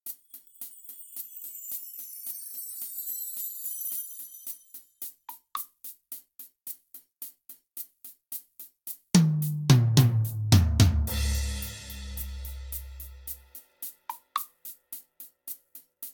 drums

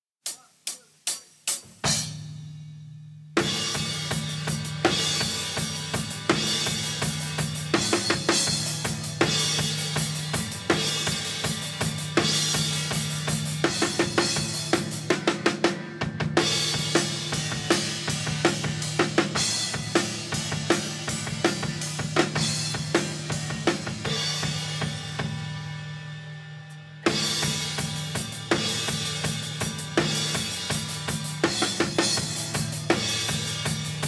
drums

